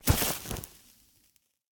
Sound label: fire impact; smite; poseidon